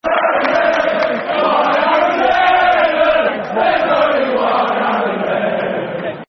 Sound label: Football Chants (Soccer Song); One Aaron Lennon; Tottenham Hotspur Thfc